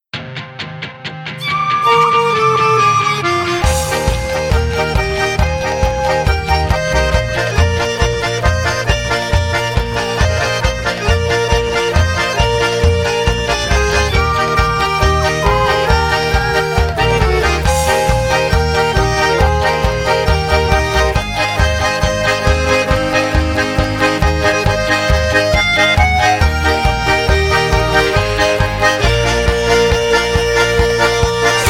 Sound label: Instrumental